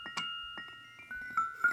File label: LOOP Mallet Stereo